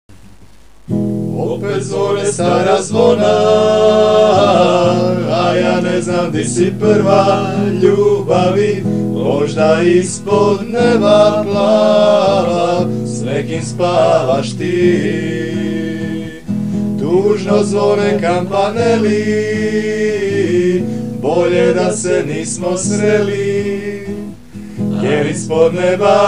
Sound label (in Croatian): zvona hi